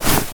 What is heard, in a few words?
run foley